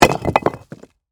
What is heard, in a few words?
Impact Rock Drop, Heavy, On, Rocks; Materials; BlastWave